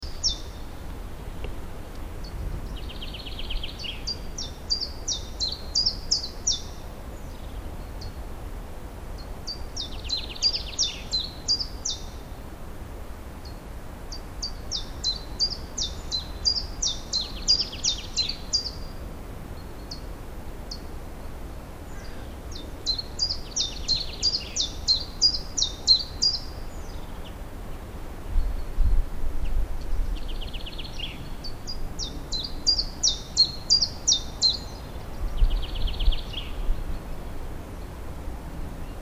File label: Common Chiffchaff